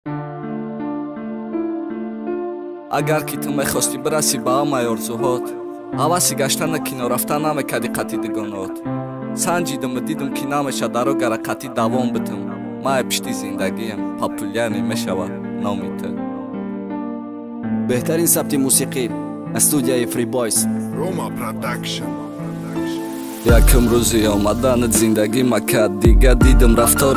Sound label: arab new rap